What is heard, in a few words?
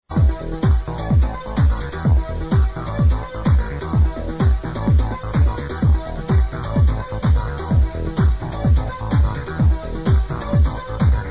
space tune